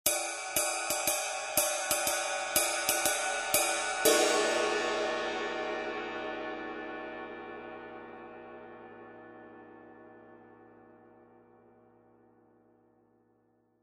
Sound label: 22in Medium Ride, cymbal sound